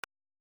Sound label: 7MHz